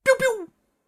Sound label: laser guns; SyrinScape; Syrinscape unplugged; Jungleplanet dinolaser battle